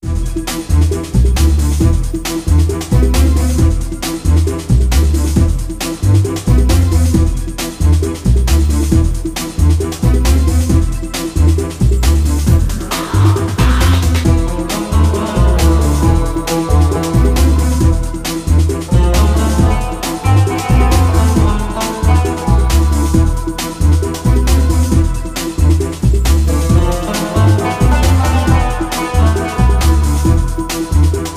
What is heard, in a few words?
Greek Hard; will rock